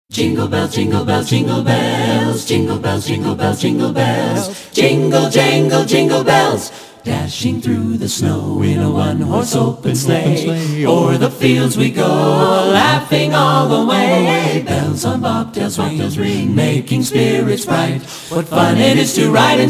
jingle bells

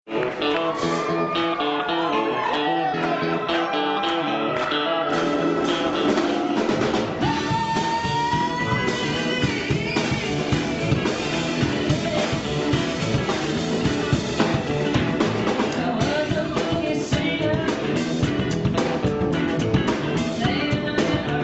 funky music

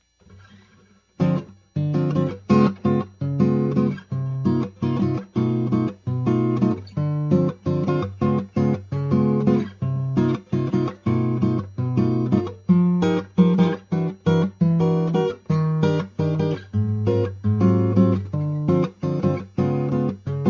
jazz samba

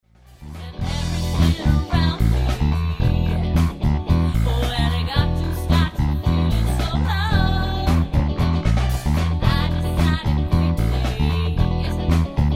funky

